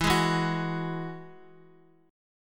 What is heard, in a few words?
E Major Add 9th, Guitar; strummed; JGuitar